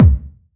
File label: BD, BASS DRUMS; EdgeSounds